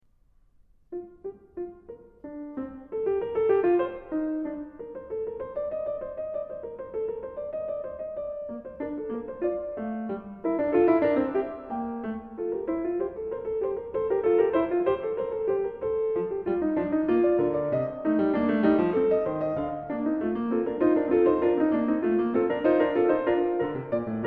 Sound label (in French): fugue en si bemol majeur; johann sebastian bach; no 21; bwv 866